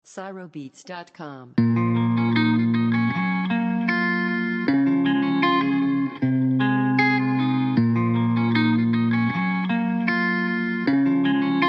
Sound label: Trap RapTrap Instrumental